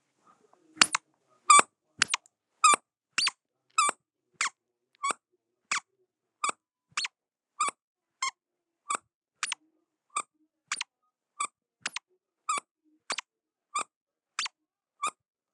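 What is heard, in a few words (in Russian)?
igrushka skripit i pishchit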